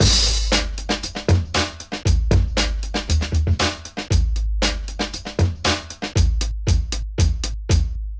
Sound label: Drums, breakbeats; Loose Foot; Ableton; Breakbeats by KutMasta Kurt